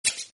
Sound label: click off